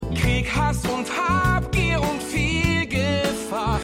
Gesang; Schmidagoon

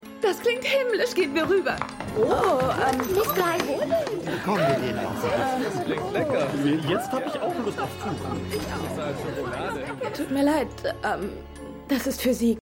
Kundenmenge; Pushing Daisies

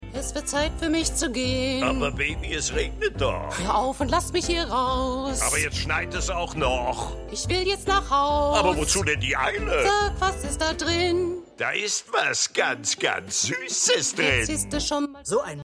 Gesang; South Park

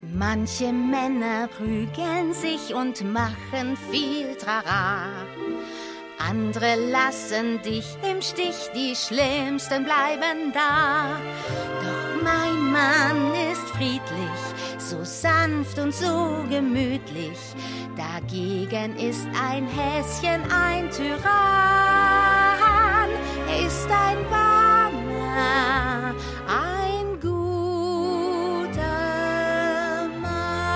Gesang; Schmigadoon; Florence Menlove

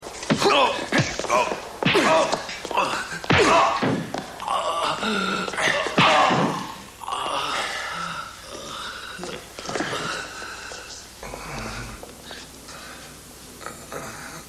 Laute; Elliott Robinson

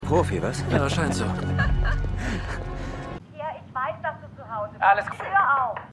Kichern, Ex Frau

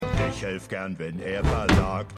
Bestattungsunternehmer Gesang; Schmigadoon

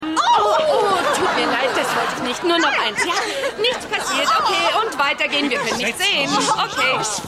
Laute; Liza Minelli; Die Nanny

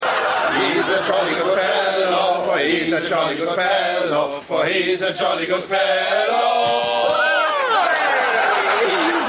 Singende Zimmerkellner

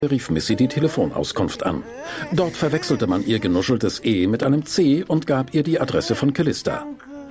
Laute; Mrs Scrivner; Pushing Daisies

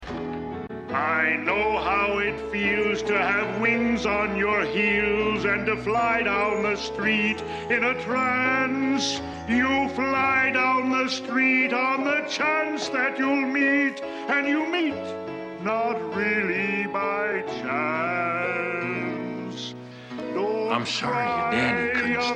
Gesang; AusgerechnetAlaska

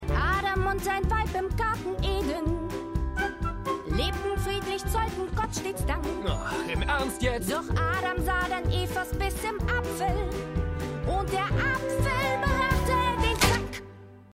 Gesang; Schmigadoon; Mildred Layton

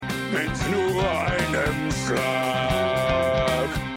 Gesang; Schmidadoon; Octavius Kratt

